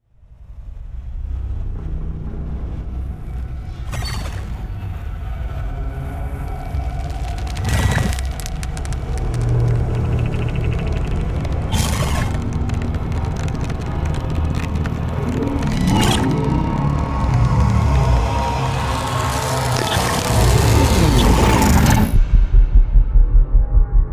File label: tension; triune digital